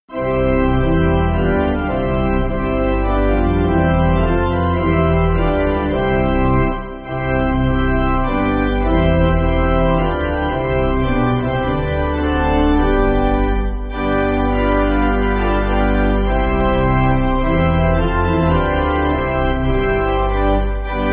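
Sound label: Pipe Organ, Music Backing; Eternal Depth Of Love; Ossett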